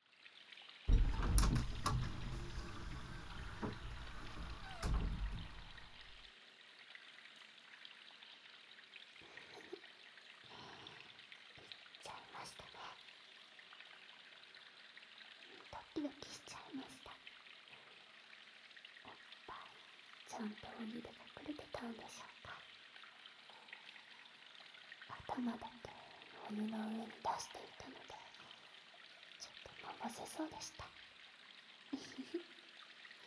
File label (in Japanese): 温泉えっち 水も滴る耳舐め手こき